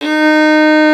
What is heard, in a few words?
fiddle; Violin 4 nv